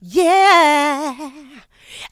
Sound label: Vocals, Adlibs; Houseworx